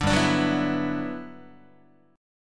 G Major 13th, Guitar; strummed; JGuitar

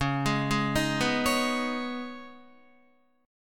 Db 7th Suspended 2nd, Guitar; Guitar-4F,4A,5C,5G,5C,6E; Db7sus2 arpeggiated

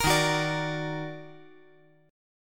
Eb Minor Major 7th Eb down, chord; EbmM7; strummed; JGuitar

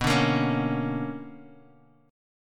B Minor Major 9th, Guitar, Half Step Down; BmM9

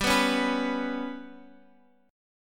G Major Flat 5th G down Guitar Open F; GMb5 strummed; JGuitar

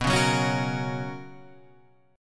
B Major 7th Suspended 2nd, chord; BM7sus2; strummed; JGuitar

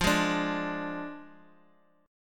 F Minor 6th F down

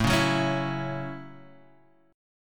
F sharp Minor 7th A

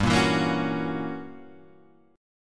F sharp 7th G, chord; strummed; JGuitar

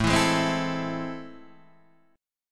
A Minor Major 7th

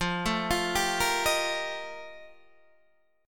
F 11th, Guitar, DADGAD

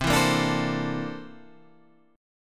C 13th C down Guitar Standard; C13 strummed

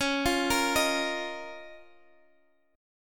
Db 6th Add 9th Db Guitar Drop D

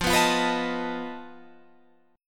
F 7th Flat 5th F down

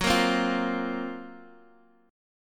F sharp Major 7th Flat 5th F sharp, Guitar Open D; x,9,8,6,8,x

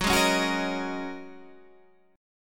G sharp 7th Suspended 2nd Suspended 4th, guitar

